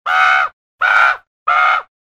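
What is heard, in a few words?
hoarse frantic crow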